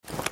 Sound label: aim